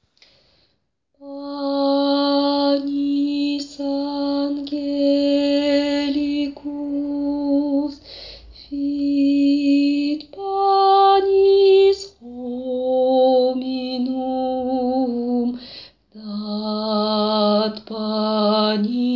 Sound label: Panis angelicus basses